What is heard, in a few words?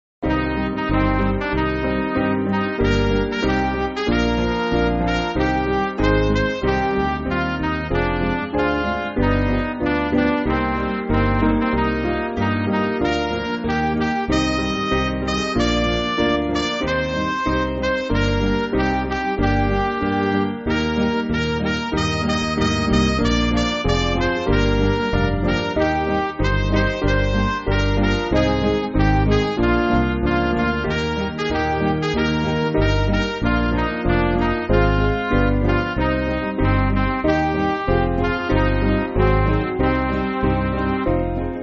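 Midi, Music Backing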